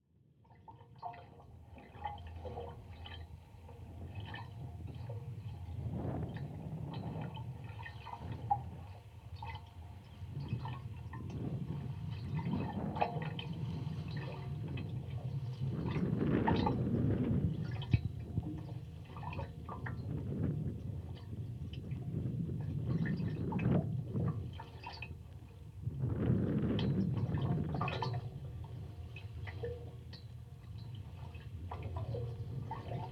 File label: MONO CONTACT MIC HAND RAIL INTO SEA; COBH